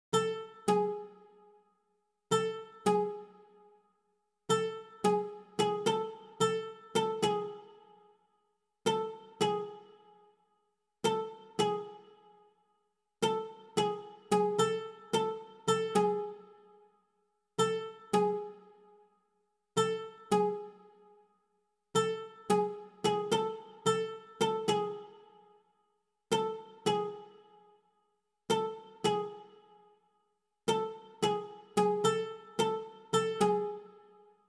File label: Bowerbird Song BGM Lute